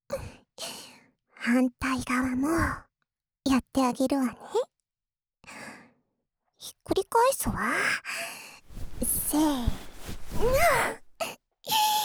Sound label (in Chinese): 继续掏耳朵吧（左）